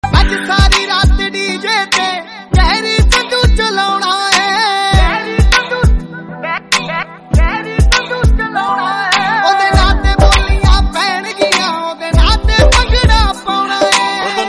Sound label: dj